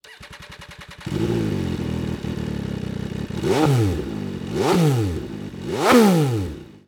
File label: dragster rc scs